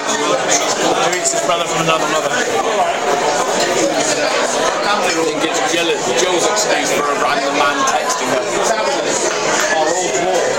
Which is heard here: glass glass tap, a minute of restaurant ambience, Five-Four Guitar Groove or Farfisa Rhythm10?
a minute of restaurant ambience